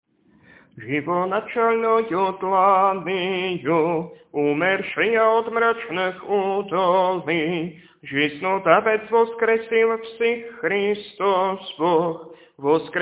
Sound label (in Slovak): Kondák voskresný hlas 6; nedelna-skola